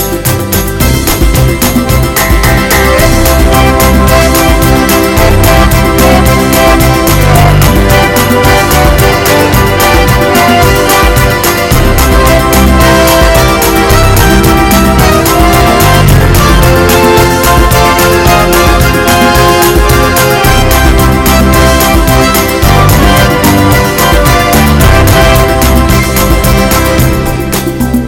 Happy Sounds

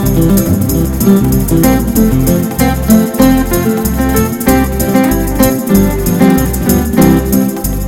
Fast and Cool

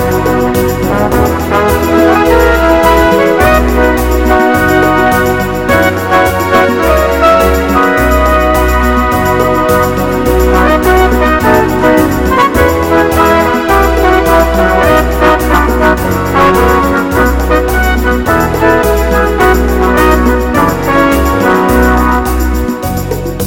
Happy Sabor

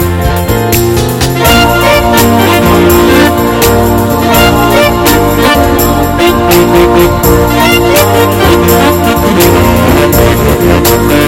Smooth Hot Ballad

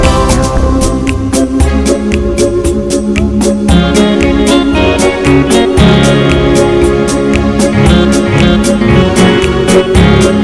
Good Timing Music

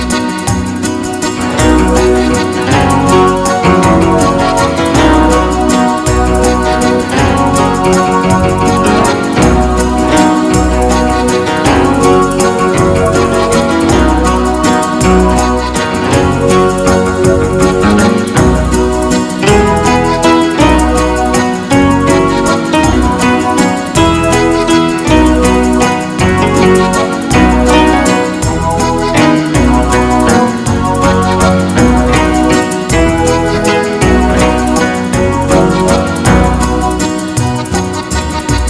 A Hot Waltz

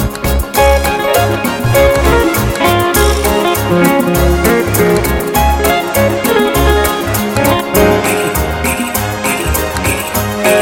Beautiful Dixieland

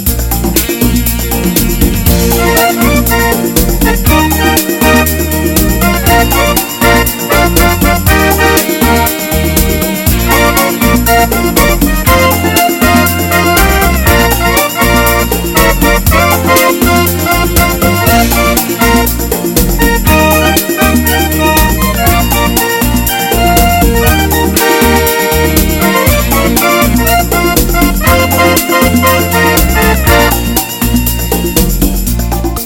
Beautiful Bachata Minor

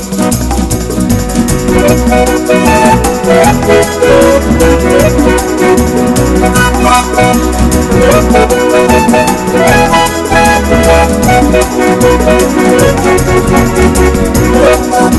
A Fast Latin Guajira

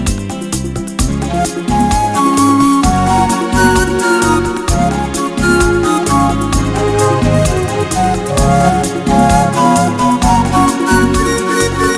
A Quick Bachata

